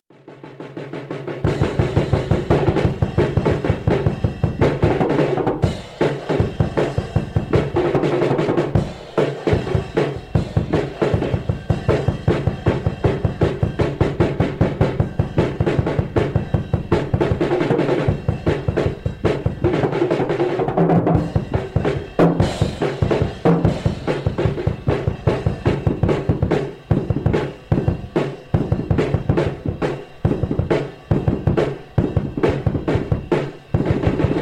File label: Drum section